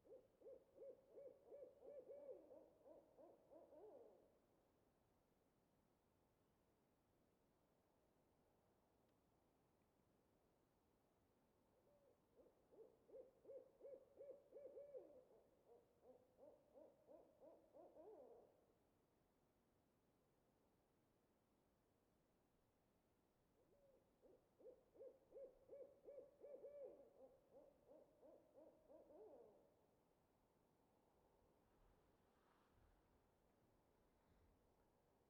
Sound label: Owl Hooting; Debarup Travel & Films